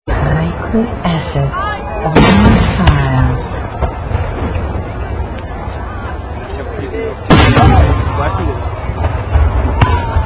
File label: fireworks